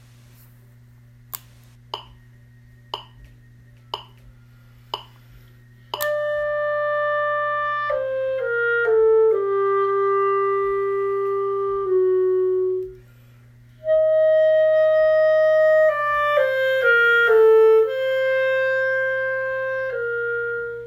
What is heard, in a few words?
clarinet set